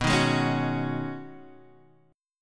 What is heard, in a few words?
B 6th Add 9th B down Guitar Half Step Down